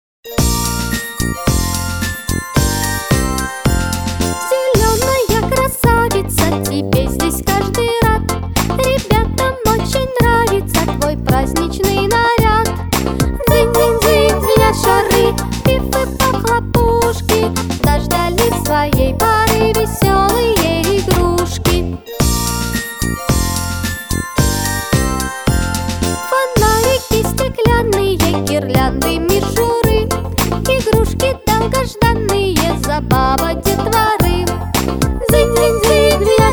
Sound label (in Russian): novogodnjaja polka